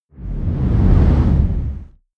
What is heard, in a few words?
whoosh dark storm